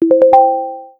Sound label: ui